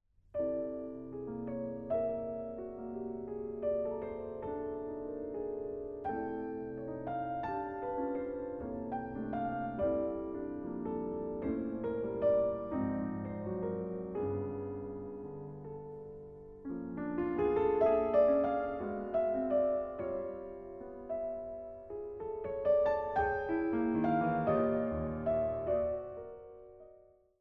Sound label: improvisation